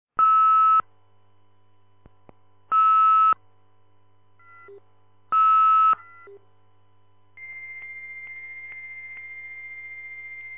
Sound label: modem sound 2400